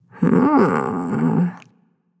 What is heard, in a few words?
thinking; smite; babayaga